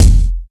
acoustic kick drum one shot f key, steel kick drum; kick drum samples